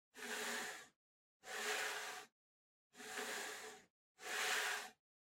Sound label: PC-Mouse-Move (Far-Hard Pad-Long)